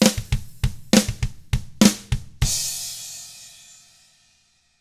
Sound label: ROCK, Drums